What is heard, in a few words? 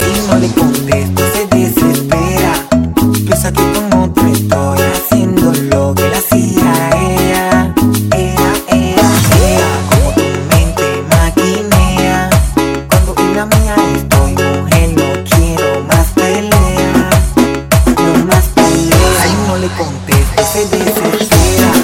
Cumbia